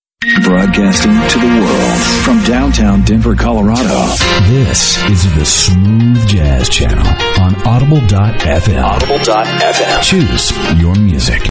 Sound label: Smooth Jazz